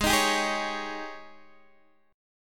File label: Ab Major 7th Suspended 4th Ab down Guitar Open D